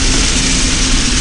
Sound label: wet mac